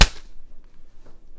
snare ish lighter distortion, Cigarette Lighter Kit